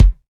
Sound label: good kick single hit f sharp key; kick drum samples